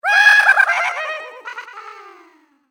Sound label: Evil Clown Laugh, Horror; Evil Laughs (Sound Effects:Horror); SoundEffects-BlastWave